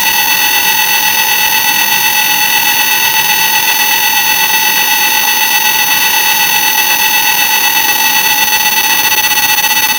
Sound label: game show